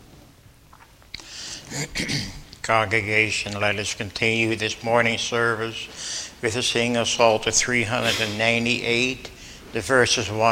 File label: Singing